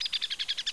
bird; XDM; ambience

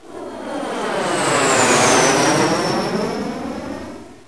jet fly by; hl1mp; stalkfire

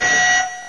alarm; XDM; ambience

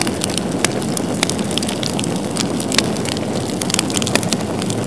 burning; XDM; ambience

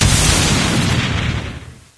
beam rifle fire, weapons; XDM

